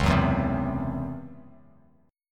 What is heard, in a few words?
C sharp-Minor Major 7th Double Flat 5th-C sharp, Bass Standard; C#mM7bb5; strummed; JGuitar